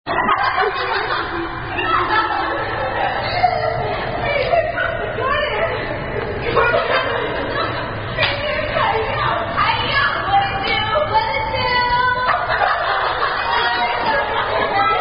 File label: Girls Playing Thumper Game; Rebel Girls Playing Thumper Game; Not A Drinking Game